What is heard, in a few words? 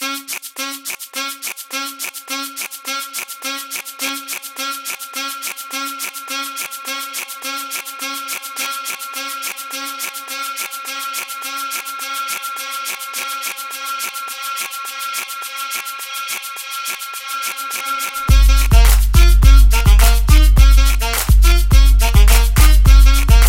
Kit Loop Full